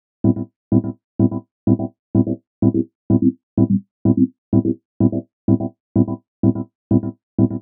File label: 126 A MUSIC; funky; Loopmasters; Funky Underground Vol 2